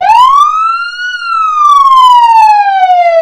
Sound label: wail